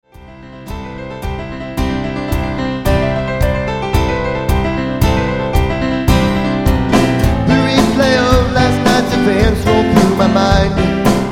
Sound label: Dueling Pianos